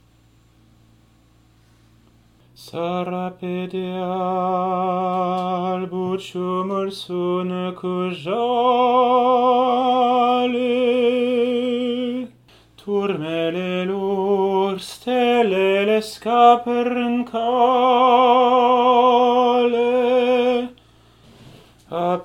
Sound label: tenor